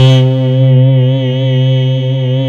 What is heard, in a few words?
SYN K-2000, Roland